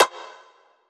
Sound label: perc roundish; one shots; SEISMIC Drum Sample Pack; Slate